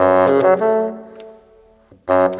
Bassoon, Orchestral, Rock Pop; Sonic Foundry (Sony Creative Software); Orchestral Series VOL-4 - Rock and Pop

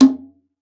Conga; ProSamples vol.42 - Session Instruments

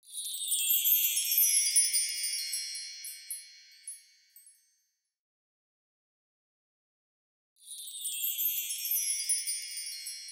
drum tracks chimes, Smooth RnB 063.5 Bmaj

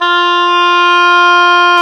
OBOE, mf f; Roland L-CDX-03 Disk 1; Oboe 2&3

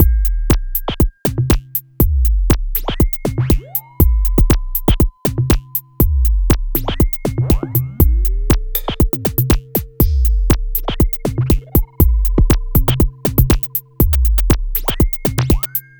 Beat, Drums, Eclectic Electronica

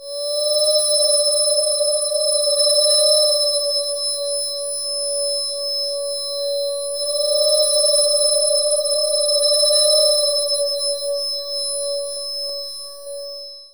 PLANET, ATMOS; AKAI Anime Sound FX

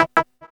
PULSE E; AMG; Global Trance Mission VOL-1

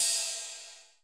CR80 CYMB, RIDE CYMBALS; Crypton - DrumBase5000

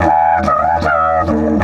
150, DIDGE RIFFS

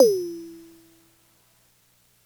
Toms, Elektron MachineDrum SPS1 MKII

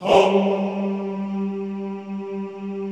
HOMMM G2, Classical Choir; Best Service

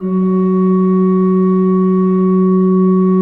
F2, Cathedral Organ; ILIO; Propeller Islands Cathedral Organ

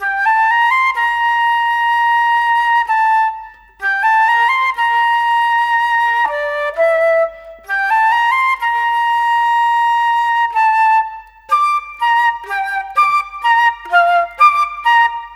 Flute, Orchestral Series Rock and Pop; Sonic Foundry (Sony Creative Software); Orchestral Series VOL-4 - Rock and Pop

